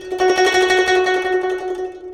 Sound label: tremolo